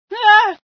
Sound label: scream, female sci